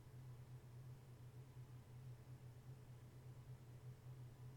ambient hum

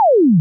laser

